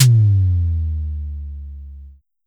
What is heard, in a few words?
Drum X Sub, Pearl Drum-X